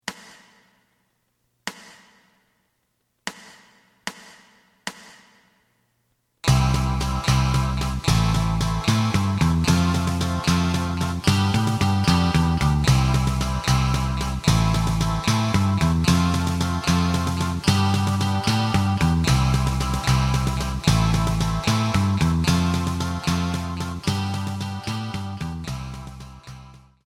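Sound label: lead guitar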